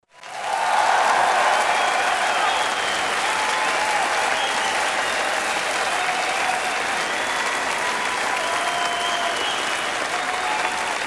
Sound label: applause